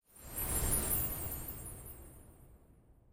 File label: idle orb float; smite; olorun